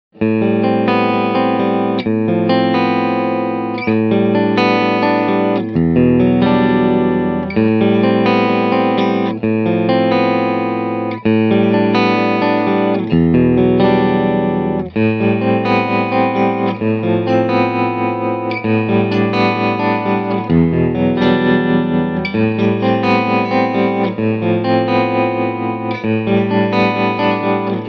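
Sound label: clean modern, Guitar Dry Sound – Guitar Wet Sound – Guitar + Band; USA Modern ’90 Clean (Mic Pre Amp Manley); From 0″ Guitar Dry Sound – From 15″ Guitar Wet Sound – From 30″ Guitar + Band; Kemper Profile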